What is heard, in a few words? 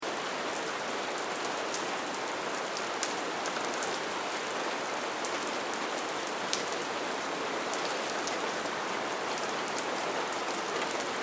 Weather Rain Light Steady Ambience Loop